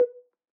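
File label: ocarina sustain B3 release; Aerophones; Edge-blown Aerophones; Releases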